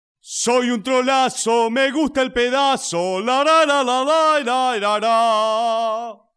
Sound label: acapela; soy un trolazo